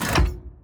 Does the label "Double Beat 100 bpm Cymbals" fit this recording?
No